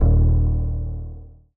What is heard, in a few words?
Bass, One Shots; Touch Loops; BlissedOutBeats